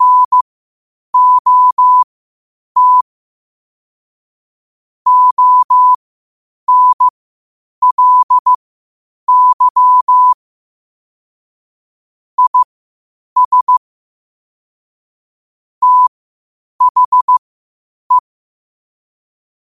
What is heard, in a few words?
5 wpm, morse